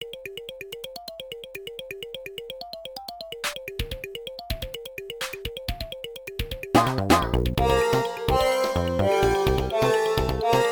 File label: Music Lava Overworld Theme; NSMB; New Super Mario Bros.